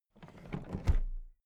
toolbox put down regular

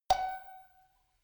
notification kalimba rattle high

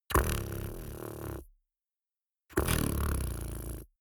beatbox tongue roll soft

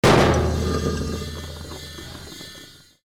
metallic impact

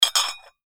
stacking plates

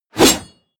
blade whoosh medium

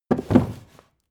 book shelve hard rumble

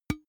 big can empty hit damped soft

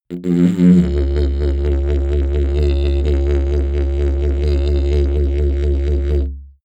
sequence didgeridoo wobble sequence

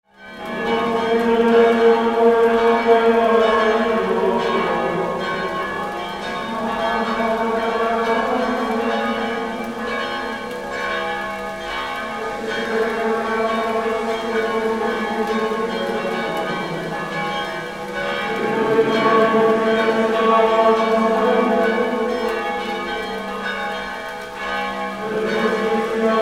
rainy monk night atmo

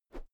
whoosh thick slow soft

